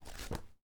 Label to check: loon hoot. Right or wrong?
Wrong